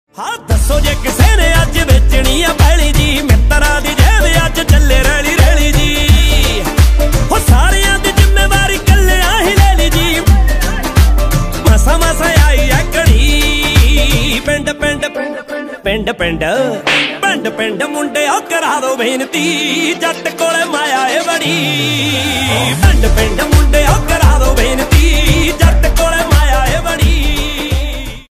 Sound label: punjabi ringtone